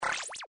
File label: camera shutter, Sony Ericsson; Z310i